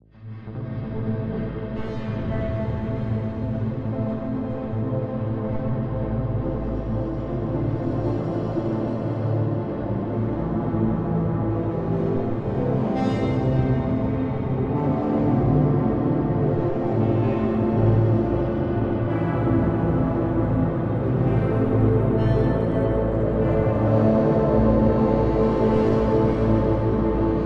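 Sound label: Lab Rat DRONE, video game soundtrack; escape academy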